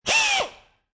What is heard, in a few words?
Pneumatic Drill, Small drill, one short burst; Drills (Sound Effects:Tools)